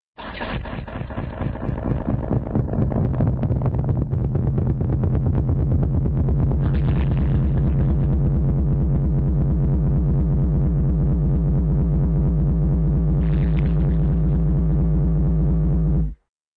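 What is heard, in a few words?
Space rotation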